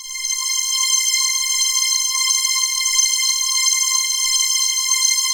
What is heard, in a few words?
HIGH STR, House Dance